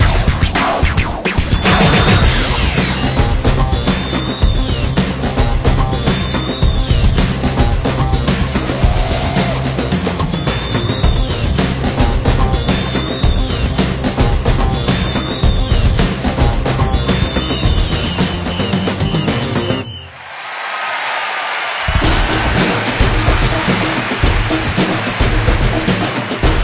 Menu Loop

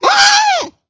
Sit leg poke Sfx

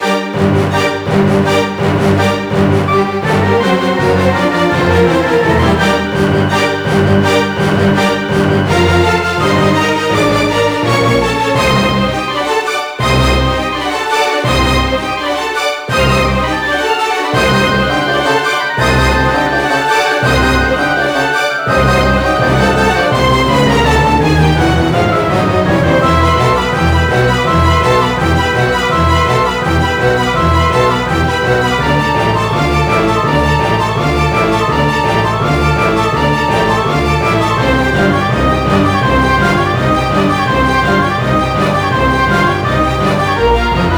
Battle; mercenaries wings the false phoenix

